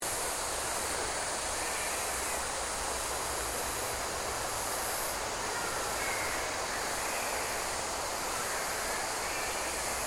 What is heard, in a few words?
Ambience Queensland Rainforest Insects Stereo, Forest Ambience; Outdoors